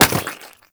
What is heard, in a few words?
Chem Strike Nox Rifle Projectile Impact